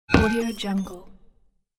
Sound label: mechanic spill fall; fxprosound